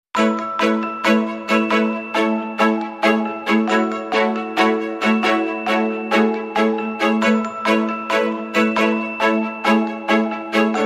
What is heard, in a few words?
Light and Positive Documentary, No Drums; 30sec